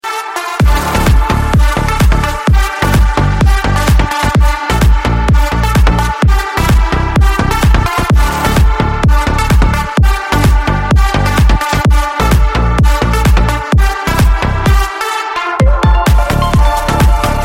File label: instrumental; Рингтоны Без Слов; Французские Рингтоны